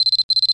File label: Sound success